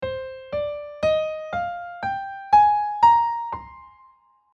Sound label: C Aeolian; Dance music theory; modes